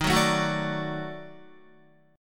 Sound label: D sharp Minor Major 7th Flat 5th D sharp down Guitar; strummed; chord